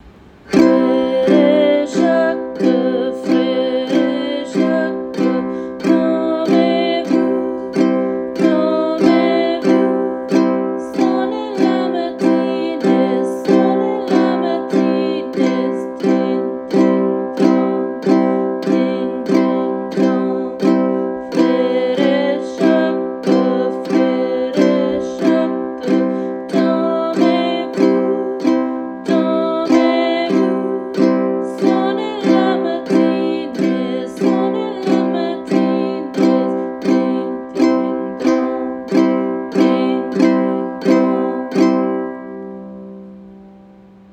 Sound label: Uke; freres Jacques; Uke book